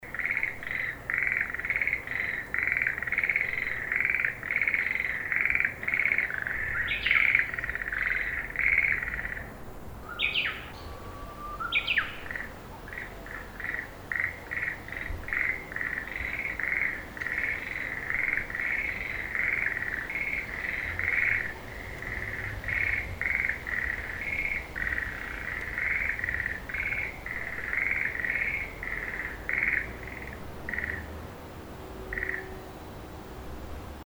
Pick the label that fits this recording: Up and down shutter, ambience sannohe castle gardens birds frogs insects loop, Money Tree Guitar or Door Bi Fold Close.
ambience sannohe castle gardens birds frogs insects loop